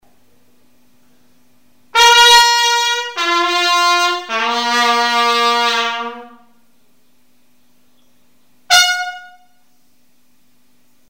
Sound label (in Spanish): descansar toque